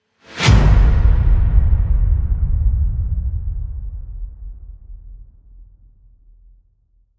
Vent Strike; Gothic Storm Music

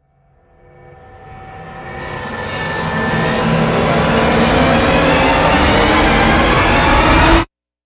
Rise; Ninja Tracks

